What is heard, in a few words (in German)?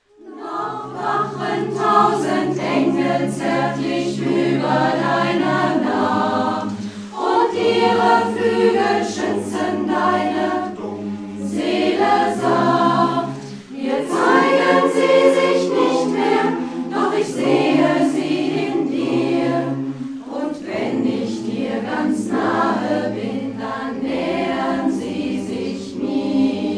schlaflied